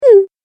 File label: Cartoon Game Voice "Mmm"